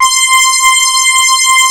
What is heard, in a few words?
XP SYNTHS; Pro-Rec - Synthsonic; JV ANALOG